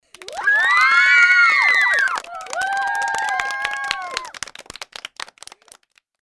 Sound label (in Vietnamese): Tieng nhom ban vo tay hoan nghenh